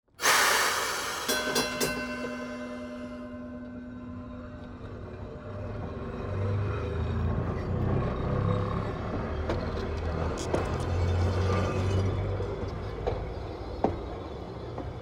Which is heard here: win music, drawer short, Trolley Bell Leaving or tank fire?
Trolley Bell Leaving